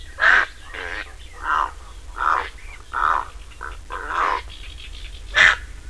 Ardea purpurea

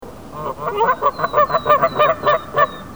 Branta canadensis

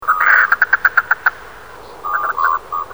Mergus merganser

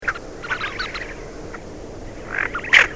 Aythya fuligula

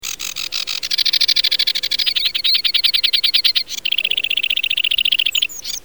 Acrocephalus schoenobaenus

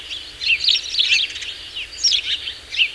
Passer domesticus

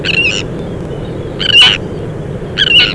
Sterna sandvicensis

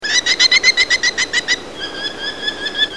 Falco columbarius

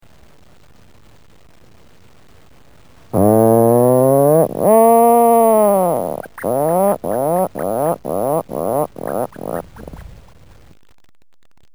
Fratercula arctica

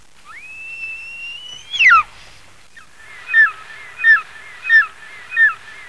Circaetus gallicus

